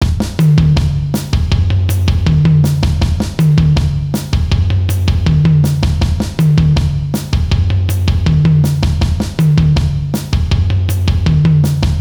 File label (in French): Samba avancé